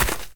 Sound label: FS Dirt; kult